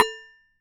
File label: Damped Hit, Glass, Large Wine Glass